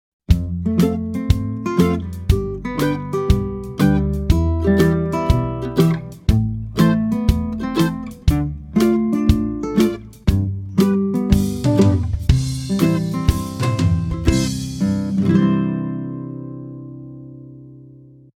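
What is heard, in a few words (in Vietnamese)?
Nhạc nền video đánh đàn guitar ngắn hay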